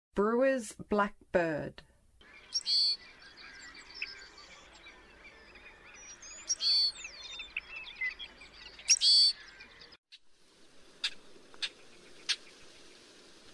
Brewers Blackbird, Songs of Birds of North America